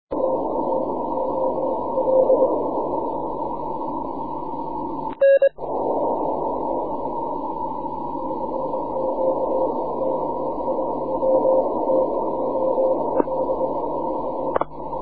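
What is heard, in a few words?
6m continuous wave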